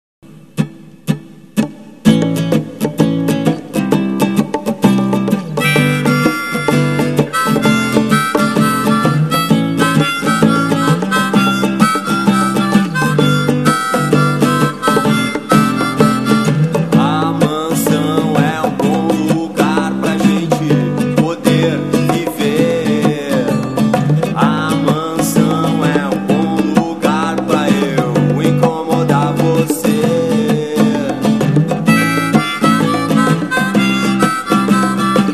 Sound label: trumpet